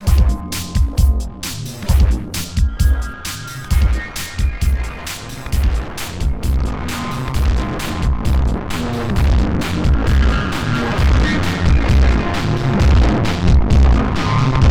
Erica Fusion Plasma Drive Track Dry Wet Sweep Voltage Minimum